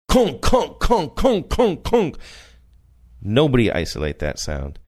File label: making series banging sounds saying nobody isolate that sound